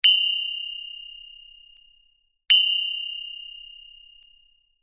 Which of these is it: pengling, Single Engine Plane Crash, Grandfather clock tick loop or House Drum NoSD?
pengling